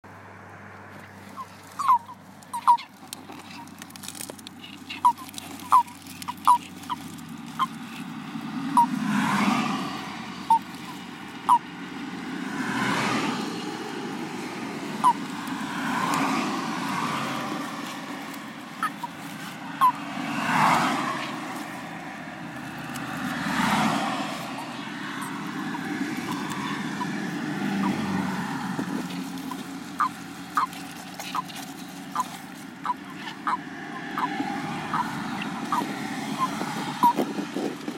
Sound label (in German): Erdkrötenrufe